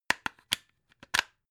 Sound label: plug plug in clatter